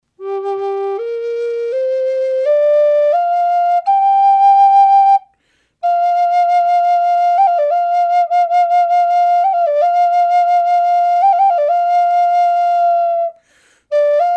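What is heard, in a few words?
natural, flute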